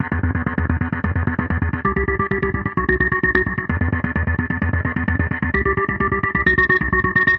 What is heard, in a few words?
dirty arp, synth and electronic loops